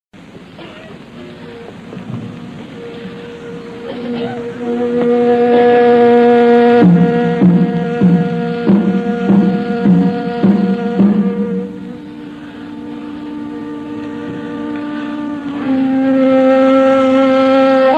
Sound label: Ney Taksim Penjgah; Musica Turca y Arabe